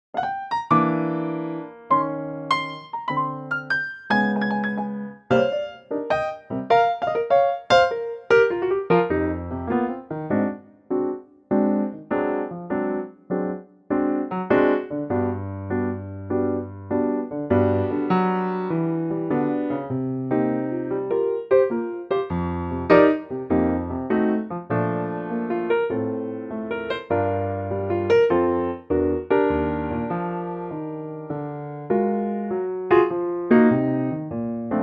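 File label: piano track Bb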